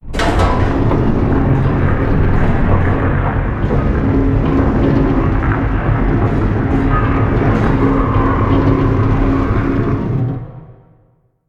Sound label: Valve Door Open